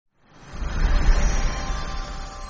whoosh

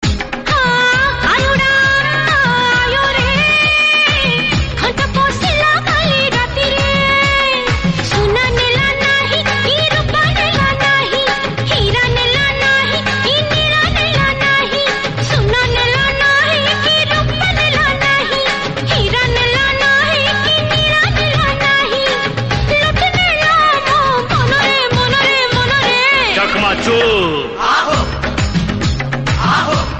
odia song

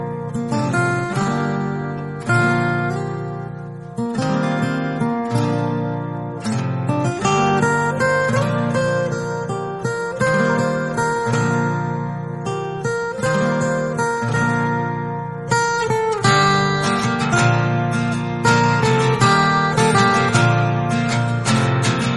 guitar music bgm

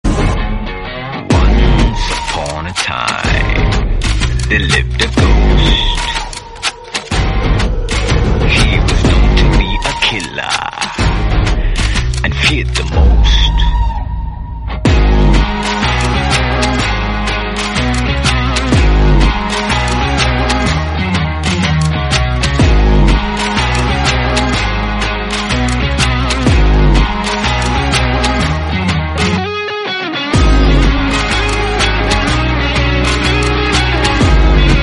telugu, callertune; vikram

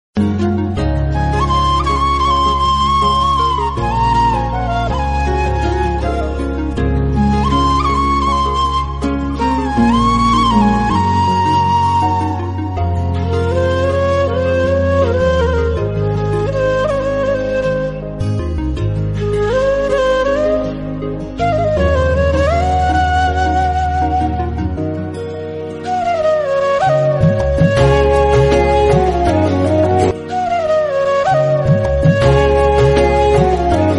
melody bgm

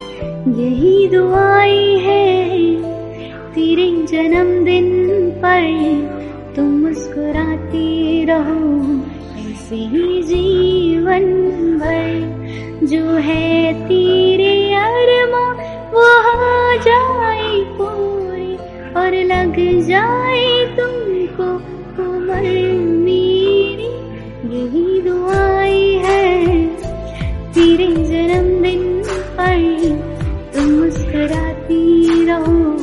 female version hindi

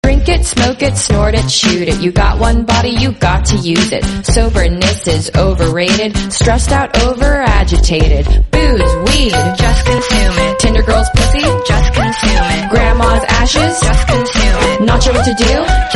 english song; Huawei

